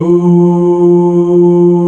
Choir F3; soundbank; instruments

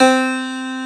Acoustic Piano C4; soundbank; instruments

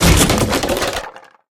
ropebridge crack

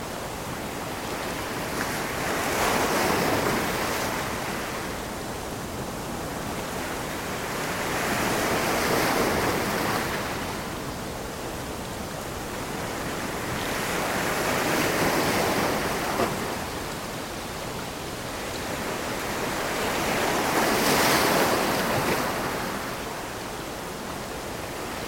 ambient trees; Bad Piggies

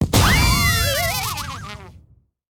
gift box

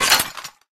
Kicker Detach

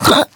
piglet hit; Bad Piggies

